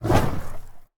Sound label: Burning Hands Whoosh; Evolve; Meteor Goliath